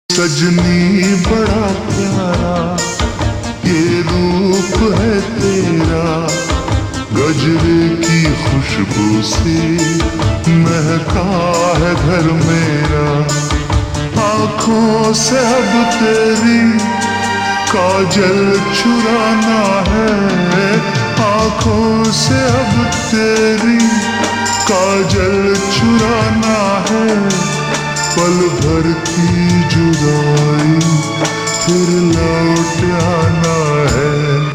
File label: Ringtone Slowed Reverb